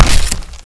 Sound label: swing hit